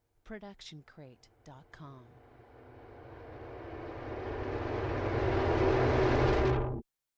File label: distortion wave reverse; SoundsCrate